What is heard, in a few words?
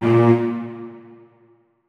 notes, keyboard strings; Hideout Furniture